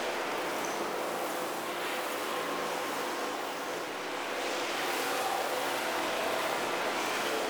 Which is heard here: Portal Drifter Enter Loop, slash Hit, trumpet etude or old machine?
Portal Drifter Enter Loop